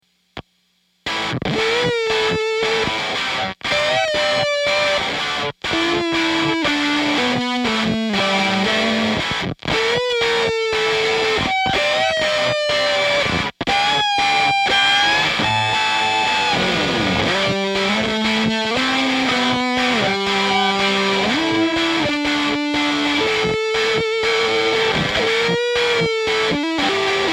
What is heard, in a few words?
GUITAR JAM AMP; devi ever usa